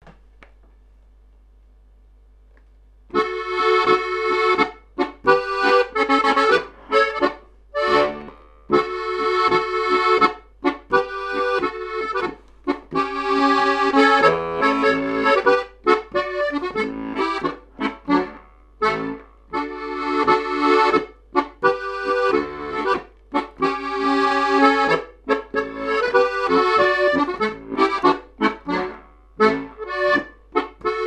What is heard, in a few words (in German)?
Kuckuckspolka Musik